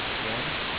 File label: noisy